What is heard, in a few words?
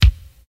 crispy kickdrum sound b key, Royality free bass drum one shot tuned to the B note; 90s